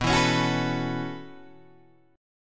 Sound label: B 9th Suspended 4th B down Guitar Open G